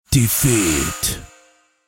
fail

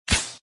pugong

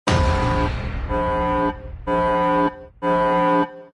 boss warning

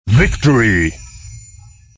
win

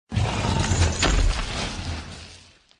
BOSS die

